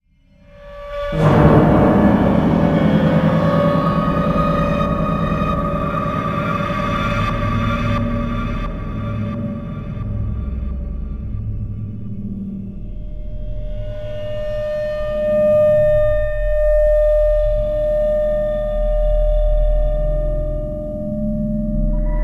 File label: summon tram; Blacksite Area 51